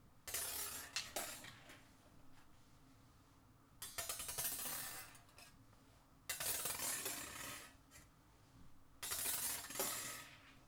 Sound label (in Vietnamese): tieng keo dan cuon bang dinh